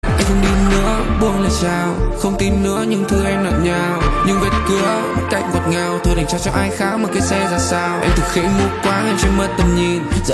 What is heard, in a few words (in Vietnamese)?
nhac chuong rap